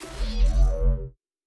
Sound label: Shield Device Start, Electric; Sci-Fi Sounds